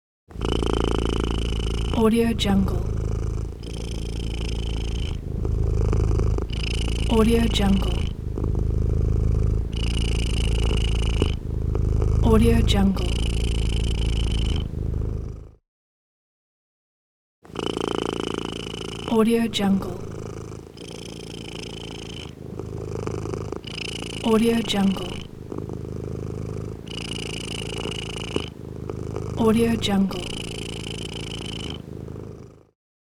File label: cat purring